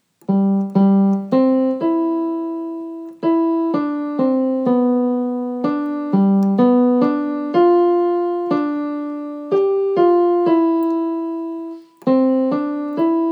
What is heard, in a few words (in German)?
Klavier